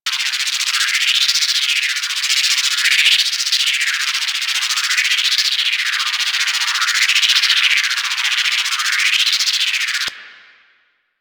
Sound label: Research Facil Plasma Wall Scan Loop; Venus; Objective Rooms